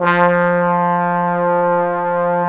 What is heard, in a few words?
BRS FF BON, Bone Sec.1; Roland L-CD702